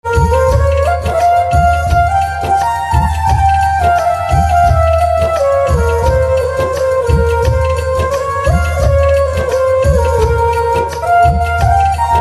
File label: Organ Instrumental Ringtone; punjabi instrumental ringtone